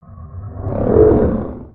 Pet Trike Growl; creatures pets

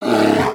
Pets Bear Panda Rage; creatures; Lego

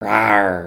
Dragon Emote

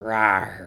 Dragon Rawr; emotes

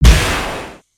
baby spider hit; creatures mechanical

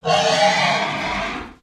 Pets Triceratops Beg; creatures; Lego

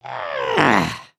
indignant, emotes

